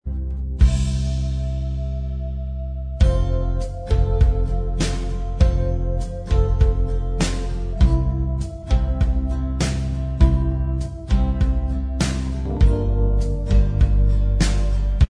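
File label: Instrumental Track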